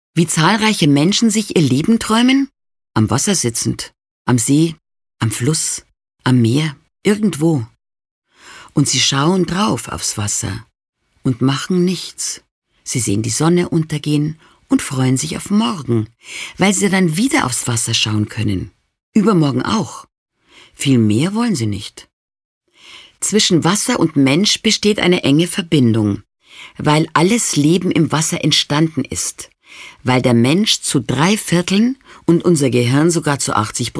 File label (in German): dreiklang